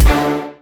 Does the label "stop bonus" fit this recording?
Yes